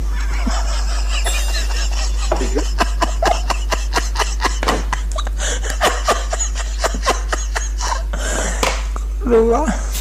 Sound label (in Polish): smiech